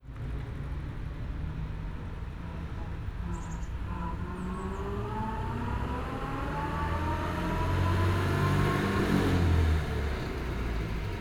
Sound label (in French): BINAURAL EXT PASSAGE VITESSE